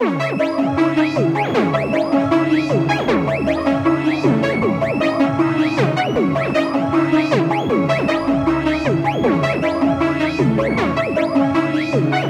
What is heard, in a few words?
Melody Loop - 156 BPM C# Min Arp; Melodies; Loop Stems; Cymatics; Oracle Sample Pack